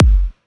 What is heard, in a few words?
house bass drum sound g key, bass drum one shot tuned to the G note